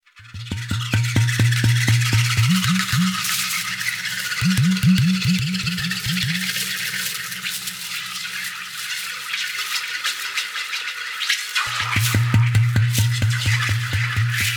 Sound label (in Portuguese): Trombetas; Civ5